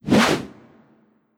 Throw; Mecano Throw1

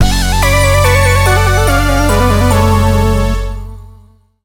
Battle End [Failure]; Spectrobes

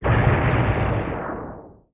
Monster Explode; battle suit aces

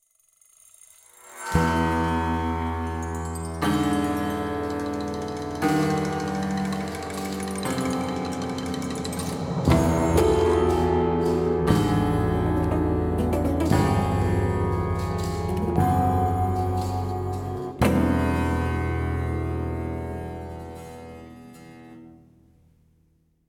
gameplay stinger worst; curious expedition 2 macos ps4 switch windows xbox one xbox series xs gamerip 2021

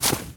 Footstep Snow Run

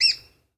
AMB Battle Village; Rabbids Multiverse

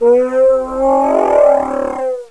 Glyptodont Dead; Knight Online

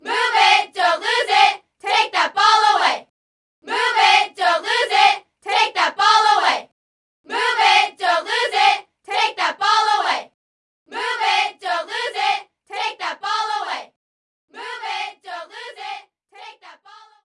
Sfx Basketball

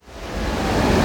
Blackhole Start

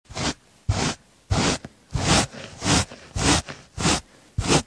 dog Multi Brush; Puppy Luv A New Breed

